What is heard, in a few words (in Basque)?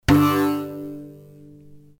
Ttun ttun kolpea SOL